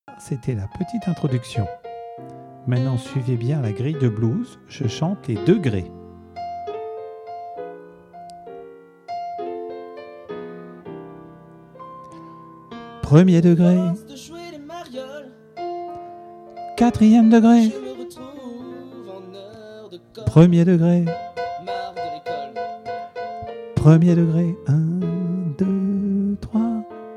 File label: Blues; Blues de l'école